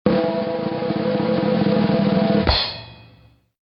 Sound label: Drum Roll; SonSpark Labs; Power Pack; Assemblies Resources; Skit Sound Effects